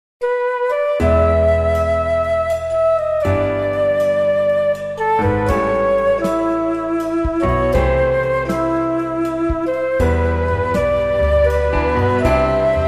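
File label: slow melody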